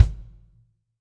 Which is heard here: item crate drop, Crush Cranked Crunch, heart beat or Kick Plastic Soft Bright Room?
Kick Plastic Soft Bright Room